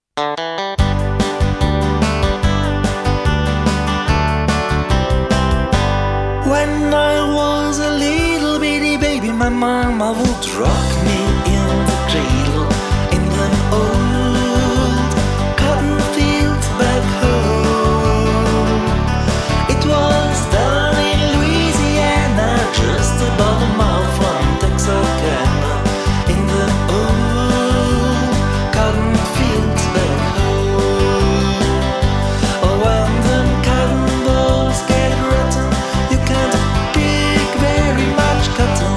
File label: Cottonfields; Solo